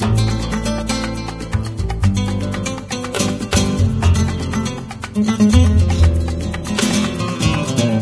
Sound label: Mexican Music; Diner Dash 2 Restaurant Rescue